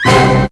ORCH; AKAI S-Series CD-ROM Sound Library VOL-2

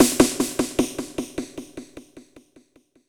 Echo Snare; Sonic Foundry (Sony Creative Software) - Futurist Drum & Bass; Drums & Percussion

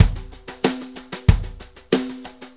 Brushes Loop, Full Kit Loops; Sonic Foundry (Sony Creative Software); Drum Tools

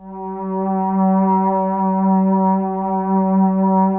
MOG PAD C3, MEMORYMOOG, OLD SYNTHS; Rubber Chicken Software Sample Bank

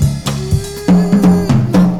gladys fill, breakcore breaks

